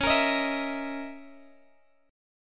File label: Db Minor 6th Add 9th Db down Guitar Open G